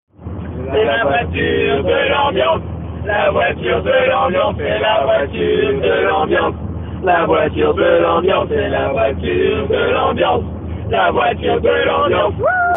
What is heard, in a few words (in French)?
Voiture Ambiance